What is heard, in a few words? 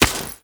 Step Dirt; Enemies; Foley; Footsteps; Warframe; Duviri; Dax